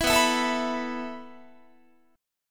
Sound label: B 7th Suspended 4th B down Guitar